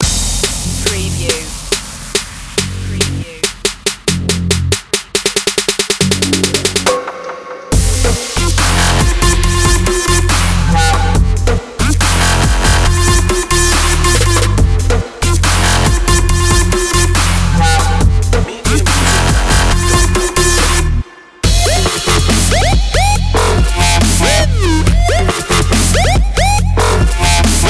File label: no horns